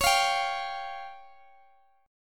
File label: Db Major Flat 5th Db down Guitar DADGAD